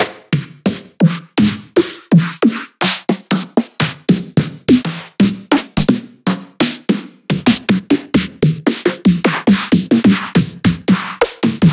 Snares Synth Snares; ghosthack

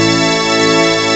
ORG MAJOR; CMI Sound Library Rev 1.3

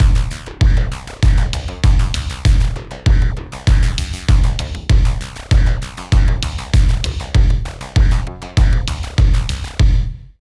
LOOP, Tekno Industrial; East West

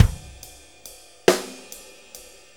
6-8 Loop, Full Kit Loops; Drum Tools

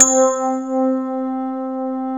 piano C 3; AKAI S-Series CD-ROM Sound Library VOL-8

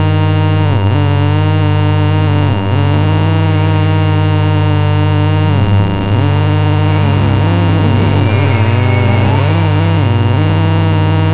TERMINAL, Disjointed Textures; USB Soundscan vol.33; AKAI; CHAOS

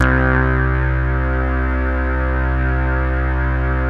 CS-15, BASSES; Masterbits - Vintage Voltage; VV BASSES

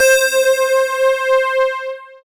SYN BELL, SYN Analog; Sound & Vision - Gigapack I CD 2 (Roland)

